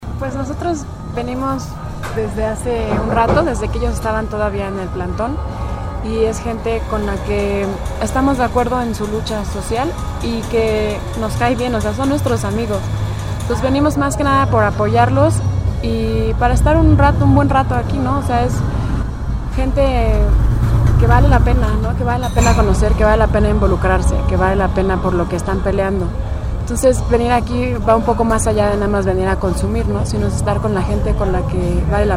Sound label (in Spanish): comensales; cafe victoria